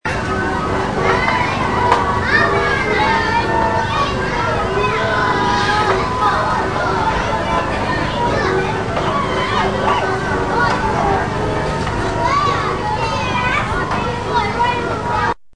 Carrusel

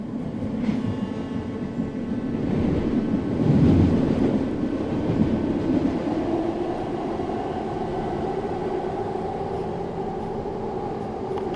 aceleracion

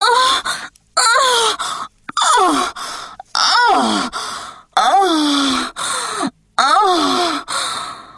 mujer gemido fuerte

